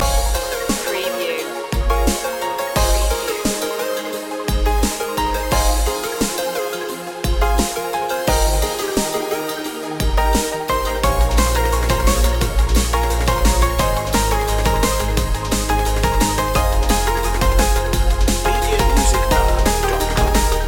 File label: dub glide loop